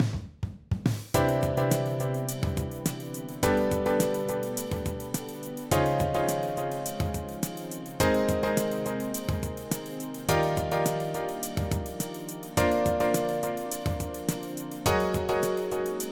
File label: credits music